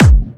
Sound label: BD Clubby, kicks; VEC1 Clubby